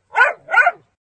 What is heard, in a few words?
Dog Alarm; bobtherobber2